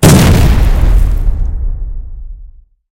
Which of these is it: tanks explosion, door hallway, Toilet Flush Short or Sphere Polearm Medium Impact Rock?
tanks explosion